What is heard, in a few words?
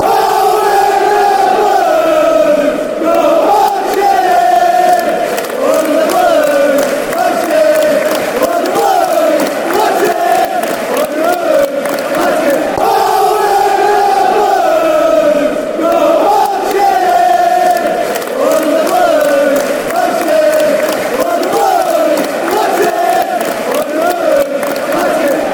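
blues marching, football songs and soccer chants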